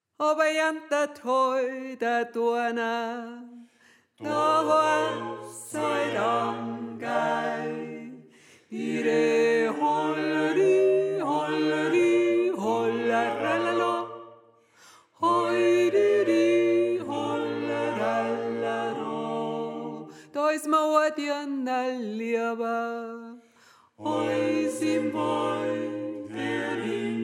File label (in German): Tenor